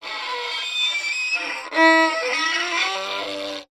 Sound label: musical violin bowed scrape badly